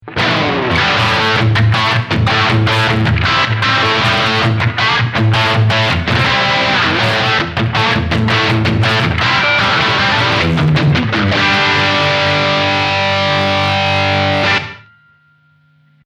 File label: heavy